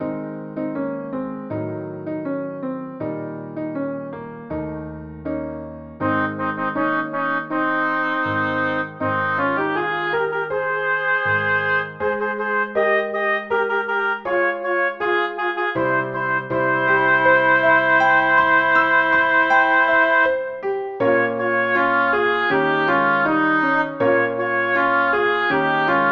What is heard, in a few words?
for oboes, synth; Come o'er the woodlands